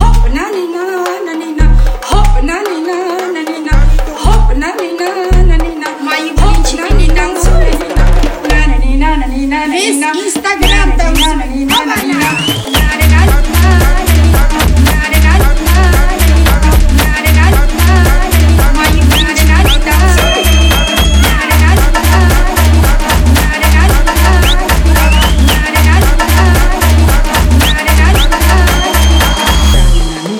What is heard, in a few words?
KAVKAZ FUNK